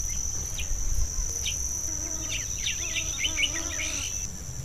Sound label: Saltators, پرنده پایکوب